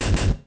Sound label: Shell; Shells; MicromanÃa 35